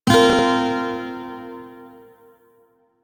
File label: Shawzin Pent Min Chord; Warframe; Lotus Shawzin